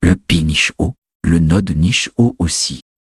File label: jingle